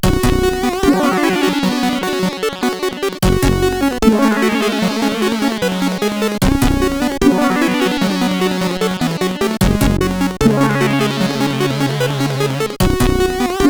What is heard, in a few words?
Atmos, CSG8580R5